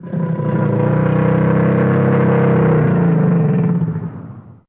alligator death

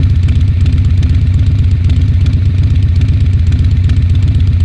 bike engine

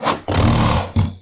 alligator attack

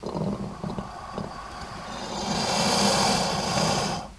alligator idle

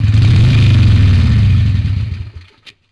bike off

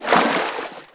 water shag

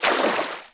water shag

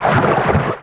alligator swim fast

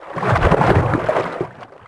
alligator swim fast

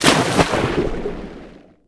water bodysplash

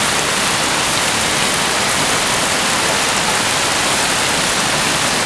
rain harder

